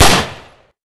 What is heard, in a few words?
pistol fire; Source Engine; Sourceforts2.0; weapons